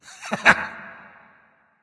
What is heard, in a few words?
"heh heh"; smite; voicepack; ullr